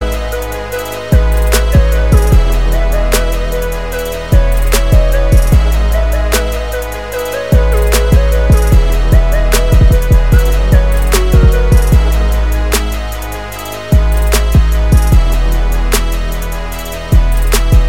nasty beat

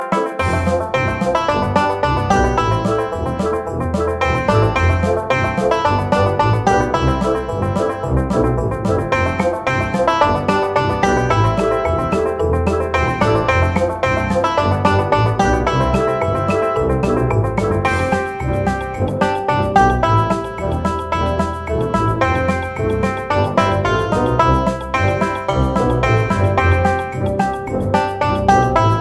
Banjo; Jontron

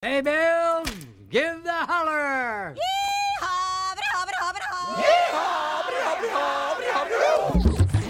Alert Tone

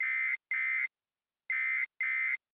Tone Alert; APX

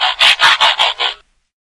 Transformers sound

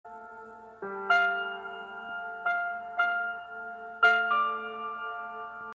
Alert; Night King

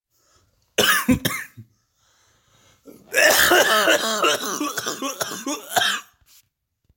Cough

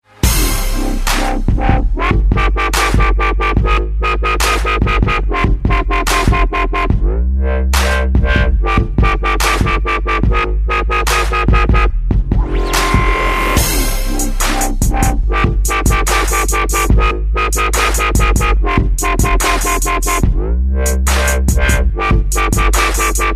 Electronic Dubstep

